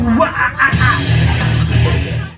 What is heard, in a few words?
ooh wa ah ah ah